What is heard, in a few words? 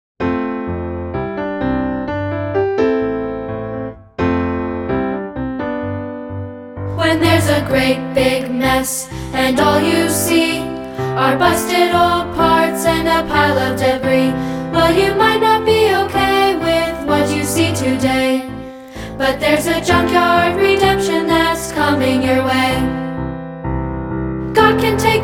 piano vocals